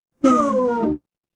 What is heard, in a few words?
feedback fail